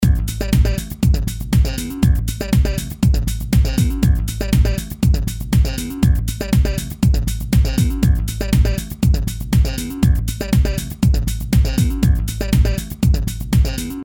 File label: game song; game 1 song